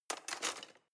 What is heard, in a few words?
knives wood drawer place soft